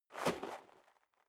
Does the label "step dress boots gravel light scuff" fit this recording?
Yes